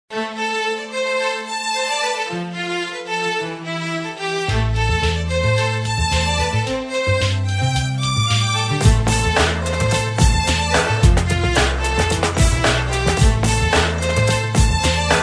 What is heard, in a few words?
Music